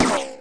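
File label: ricochet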